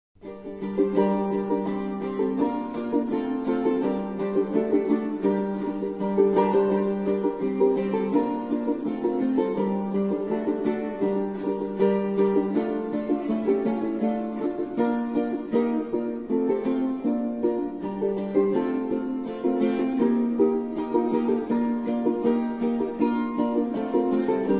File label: italian rant